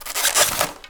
Squeak Styrofoam; SFX Kit

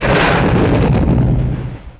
Depth Charge; Weapons

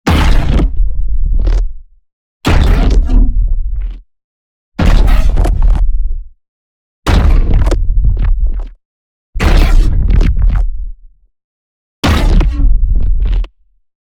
NEON BLAST; Fractured Reality; boom library

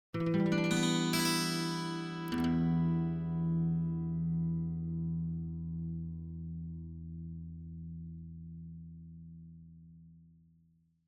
Acoustic Guitar - Arpeggio - E Minor Clean; Hollywood Edge; sound designer's toolkit v2

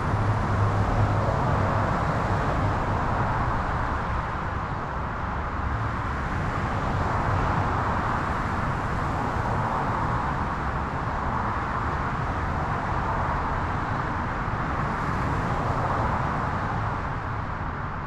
Urban traffic busy interstate highway; urban and suburban; boom library

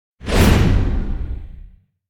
Impact Whoosh High Metal Heavy - Short; Hollywood Edge; sound designer's toolkit v2

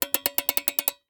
Alarm Clock Wind; Clocks, Watches and Timers; Blastwave

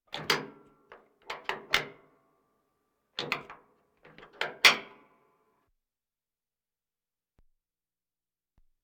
Military vehicles; Valentino Production Sound Effects Library CD50

